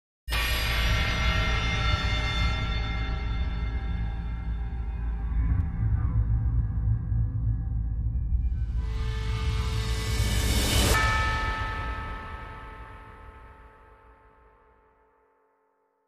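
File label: Dramatic Sting, No Orchestra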